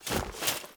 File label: Foley Roll; Sinecure; Melee Grunt; Enemy